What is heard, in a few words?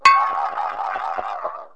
clank, cartoon; KABOOM! - Wild and Wacky Sound Software; accents